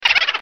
boo laugh

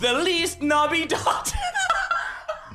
spanish christmas song

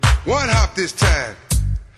one hop

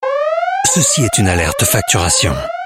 alert invoice

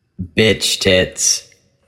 hard tune d major

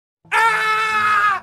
screaming ahhhhhh; jacksepticeye

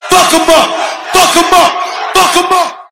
concert

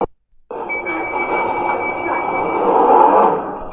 london tube doors

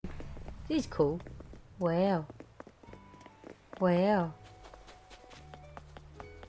duck wow

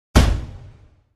car hit sound effect

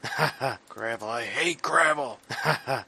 gravel

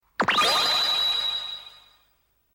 ice sound effect

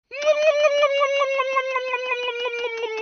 kissy; miss piggy

